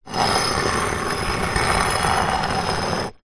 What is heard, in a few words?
rock or stone movement drag on concrete ground; foley